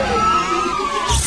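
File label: door close; DOOM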